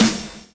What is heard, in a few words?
DRUM CHOP; AMG Gota Yashiki Groove Activator